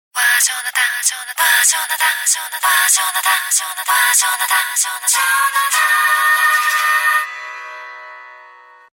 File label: Wow Call, Ringtones; LG SU370 Optimus One